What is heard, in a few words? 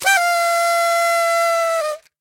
Horn; Dungeon Defenders